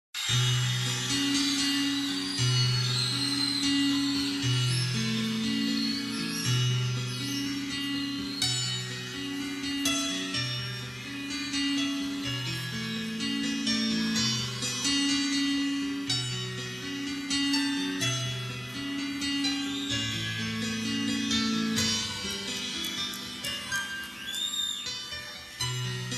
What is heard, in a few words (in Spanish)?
Animal Nocturno instrumental; TV Azteca 2008